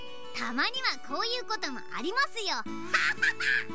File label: hahaha